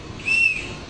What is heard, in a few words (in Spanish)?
silbato minitren